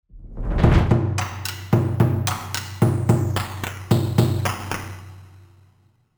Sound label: Jaeger Percussions NDC Big Knob